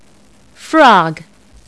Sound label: frog